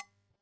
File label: theme toggle